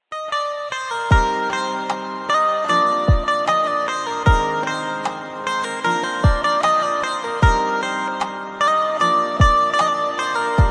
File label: banjo ringtone lofi mix instrumental ringtone